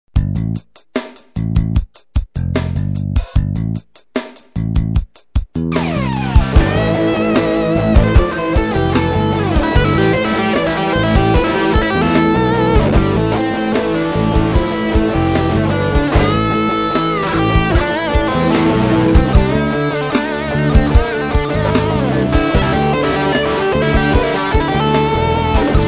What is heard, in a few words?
Fighting Guitars